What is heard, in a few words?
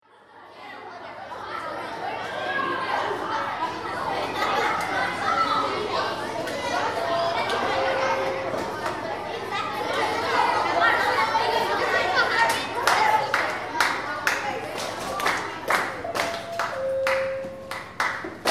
clapping for attention